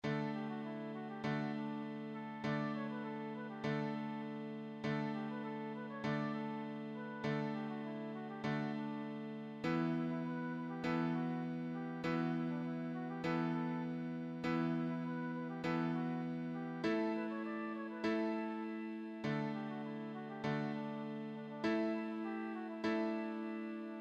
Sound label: Piano